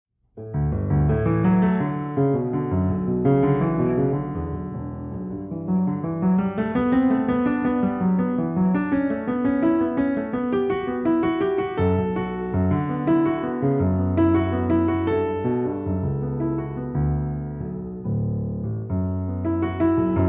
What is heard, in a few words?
Improvisation